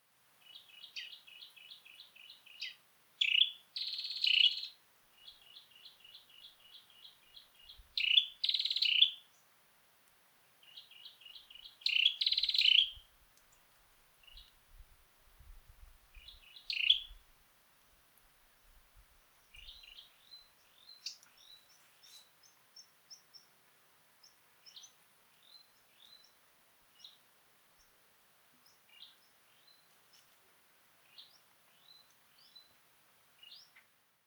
Dark necked Tailorbird Orthotomus atrogularis nitidus; tropical birdsong